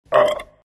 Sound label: pain; dragon knight